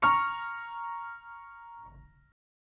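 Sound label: A sharp minor higher, chords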